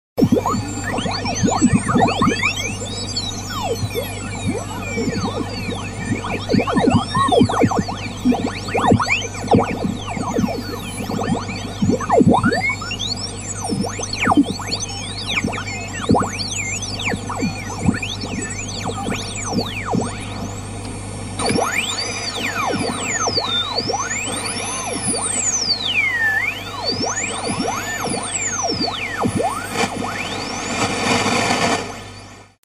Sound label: Ringtone